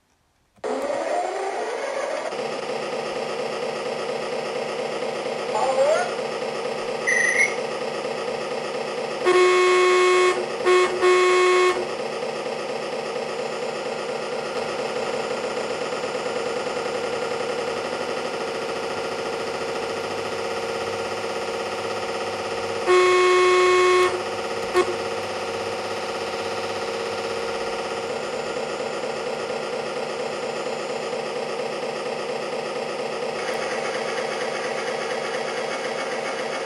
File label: light sounds